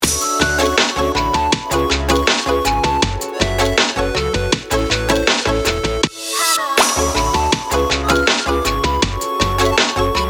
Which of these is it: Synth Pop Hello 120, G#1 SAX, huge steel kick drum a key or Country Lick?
Synth Pop Hello 120